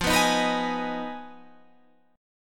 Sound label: Ab Minor chord; Abm/F strummed